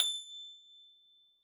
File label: A5, Harpsichord; Harpsichord -ped; Total Piano